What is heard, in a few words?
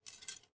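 arrow rattle; smite; medusa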